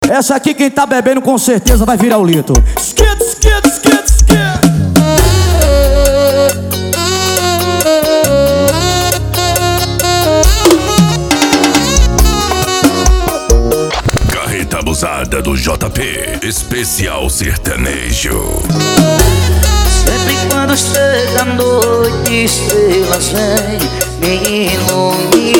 sertanejo

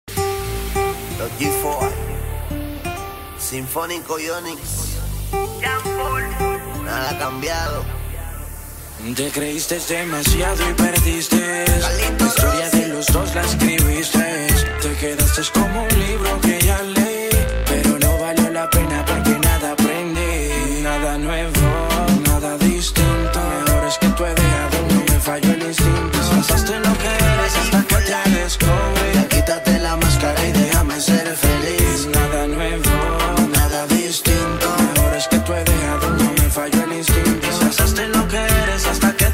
reggaeton mix; reggaeton mix evolution

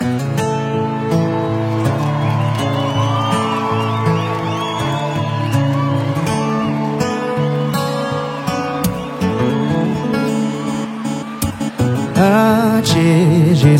funk sertanejo; poderoso lava jato 2025

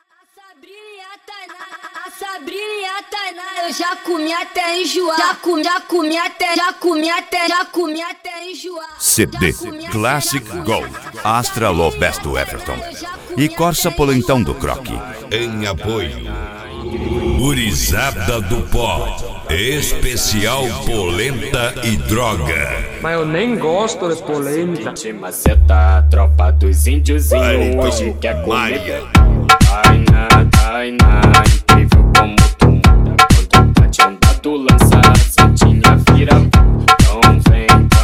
low bass; classic gold astra; corsa polentao

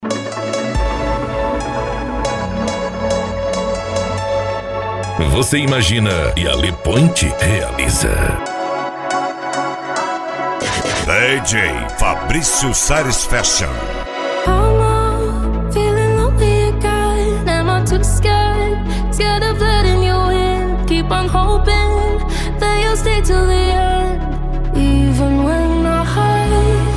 deep house

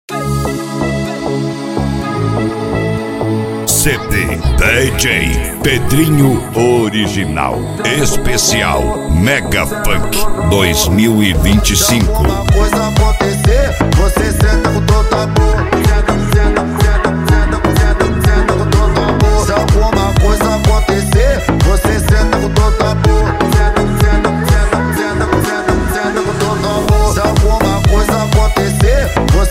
mega funk

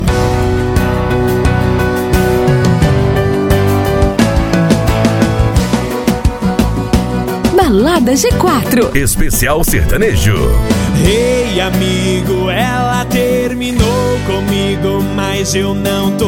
sertanejo; balada g4

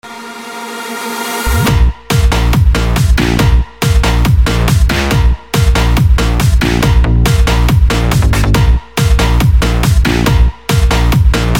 mega electro mix

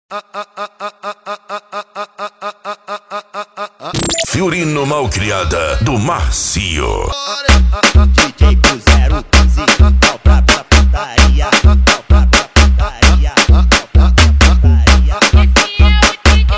mega funk bass

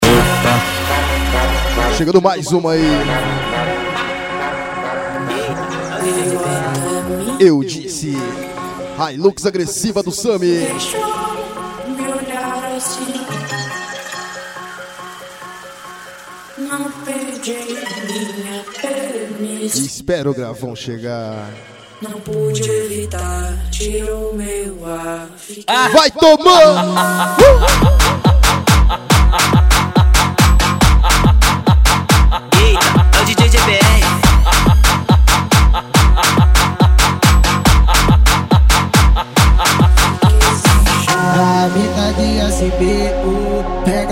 dance e funk

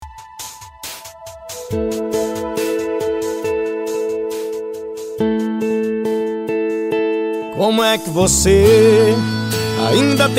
retro

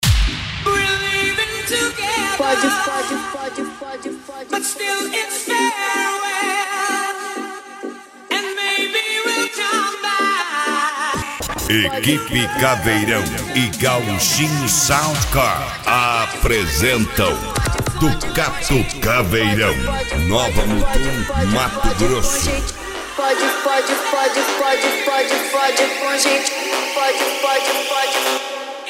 especial mega funk

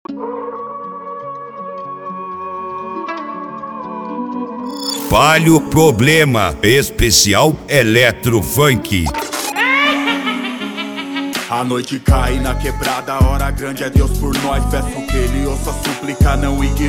eletro funk

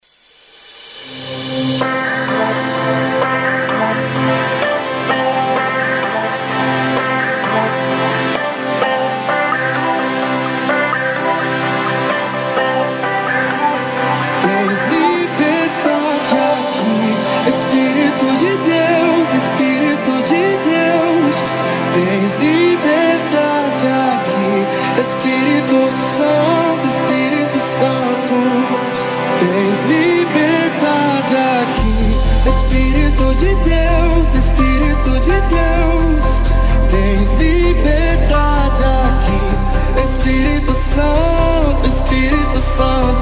remix gospel

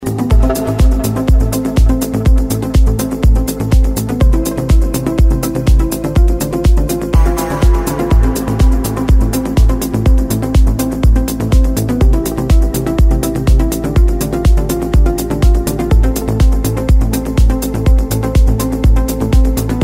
progressive melodic house

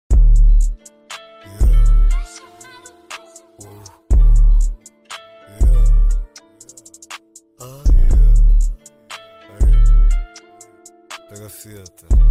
set trap br graves bass

